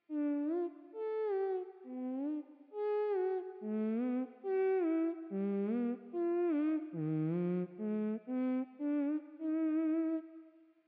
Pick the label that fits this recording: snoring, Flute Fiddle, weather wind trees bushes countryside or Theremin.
Theremin